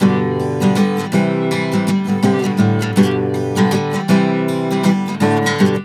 GTR6, Celtic Flavours; USB Soundscan vol.42; AKAI

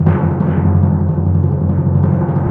PRC TIMPRO, Timp Roll

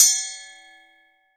SPOKE HHO, SPOKE HI HAT

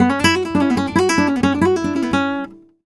Guitar Picado, Flamenco, f#; Best Service ProSamples vol.46 - Flamenco

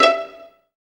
STR PIZZ, STR Pizzicato; Sound & Vision; Gigapack I CD 2; Orchestral

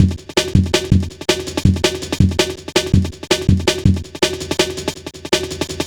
Funki, Jungle, Drum Loops 163.5; Zero-G Jungle Warfare 1, 2 & 3

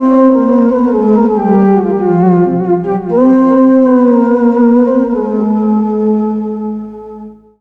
SYNTH, ARABIC

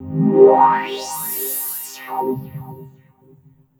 SYN, 160 BPM; Ueberschall; Drum & Bass Flash Trackz

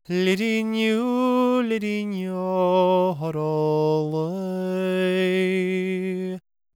GAELIC, World Voices; ILIO; Vocal Planet

